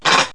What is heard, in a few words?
macaque sounds; primate